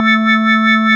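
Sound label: organ Vox A3, Continental, wah bar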